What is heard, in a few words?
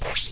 PC Off; Radio Software; JPS; ACU1K; ACU Controller